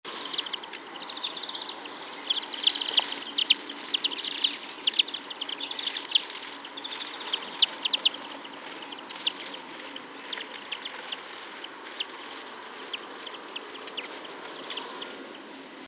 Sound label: Chloris chloris; SETE